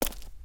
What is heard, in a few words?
Footstep Stone Outside; creatures pets